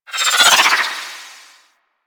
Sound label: Arch Wing Prime Dodge Roll Right; Movement; Warframe; Vehicles